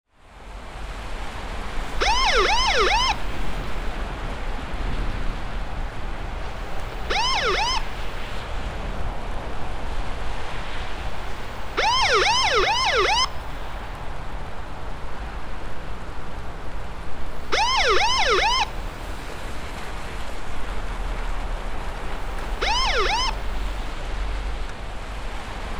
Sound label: Police car siren switched on and off several times